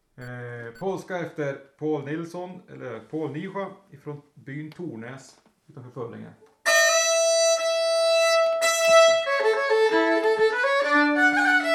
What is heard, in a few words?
Polska; e Pål-Nischa